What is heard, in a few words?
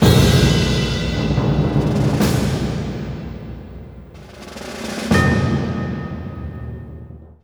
Sting